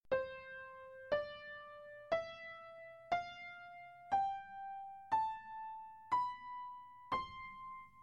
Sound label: piano doremi low